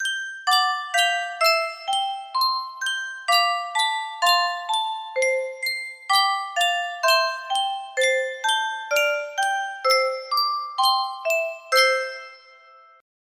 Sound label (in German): Yunsheng Spieluhr